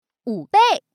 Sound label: Chip